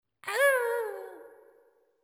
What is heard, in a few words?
Wail; SFX; GGJ 2022